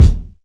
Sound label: Kick; Ableton Live 12 Lite Core Library